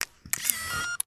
technology camera digital small switch on lens out; sound effects one